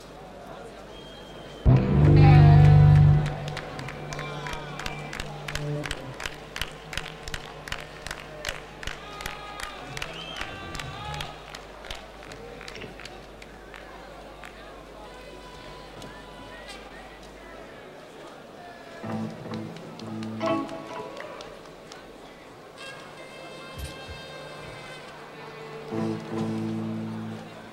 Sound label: Crowd tuning